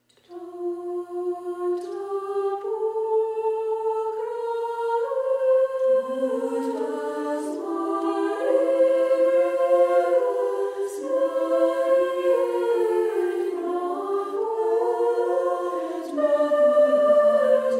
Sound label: Benedictines Tota Pulchra Es